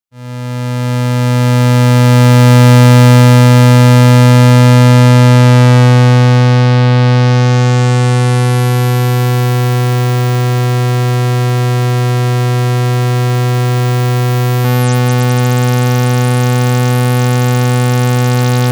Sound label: Out LFO Rate Drone mit Resonanz Drive